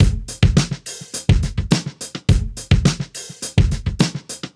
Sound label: Drums Proc 105 bpm, funk soul; sampled funk soul